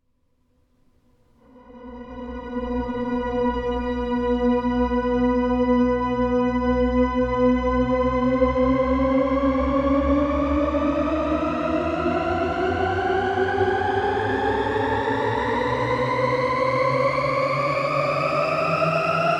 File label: Stretched Theremin